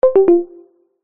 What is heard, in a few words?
notification tone soft subtle